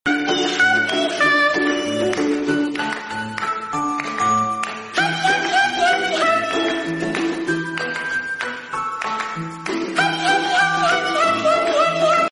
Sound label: happy happy happy cat english